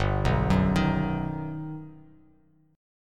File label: G Augmented Major 7th, Bass Standard; G+M7 arpeggiated; JGuitar